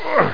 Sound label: exert; duke3d